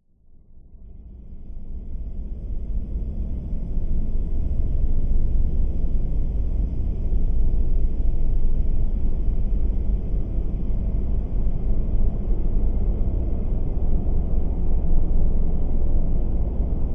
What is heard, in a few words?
sound design drone loud slightly industrial rumbling hiss